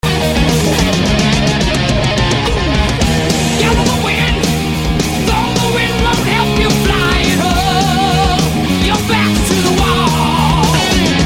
Metal

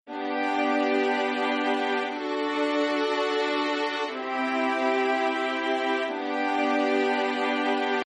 Strings

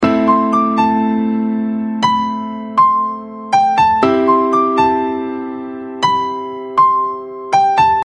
harmony

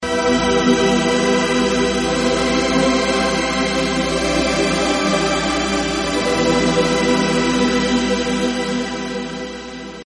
Pad

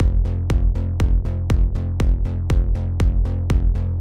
Kick Bass

